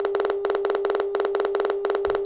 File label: signal, BPSK